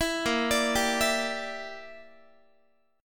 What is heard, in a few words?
A sharp 6th Flat 5th, Guitar; A#6b5; arpeggiated; 19,18,17,17,20,x